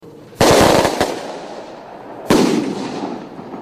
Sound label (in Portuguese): fogos foguete; caruaru